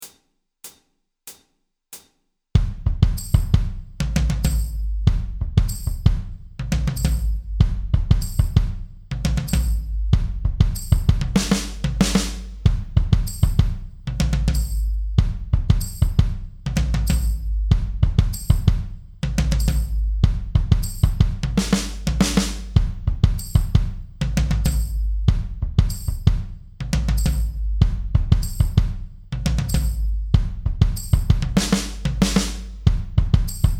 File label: 95 bpm drum track heavy hitter loop; Second Alert; FAWM